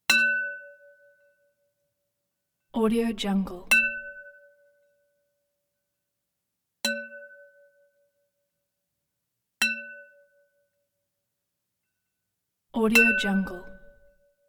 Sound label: wine decanter deep resonance bell ring